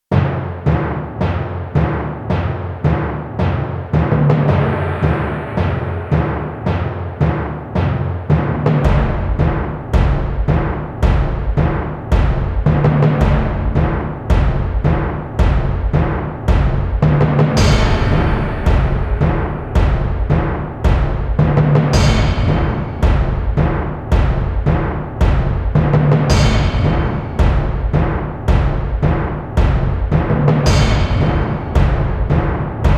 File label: drums